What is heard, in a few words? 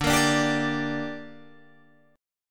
D Suspended 4th Sharp 5th D down Guitar Open G, chord